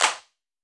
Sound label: CLAP, HAND CLAP; AKAI S6000 CD-ROM - Volume 5